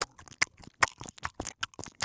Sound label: cooking game action chomp; esmteam